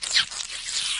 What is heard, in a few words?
infector idle; flood